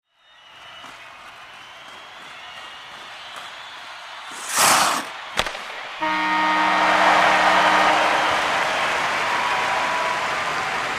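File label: hockey match and horn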